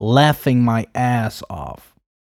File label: laughing